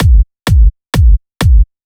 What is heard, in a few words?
Rap Night Club Kick, 128 BPM; Vengeance Samples; Vocal-Hook Kits; Song Kit